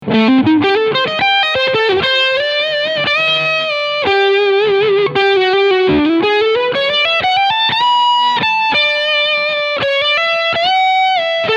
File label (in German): Harley Benton TE 20MN E Gitarre Overdrive Steg Pickup